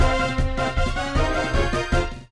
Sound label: Short Attack